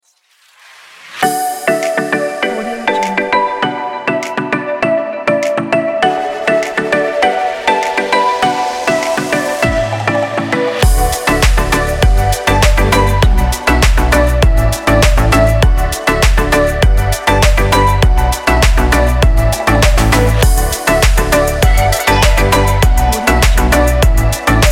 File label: tropical house